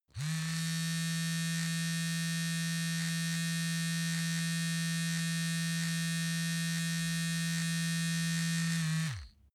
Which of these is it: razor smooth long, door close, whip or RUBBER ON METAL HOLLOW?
razor smooth long